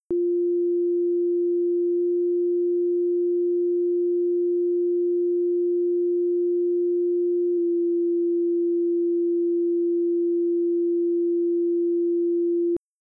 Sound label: AUSampler pitch jumps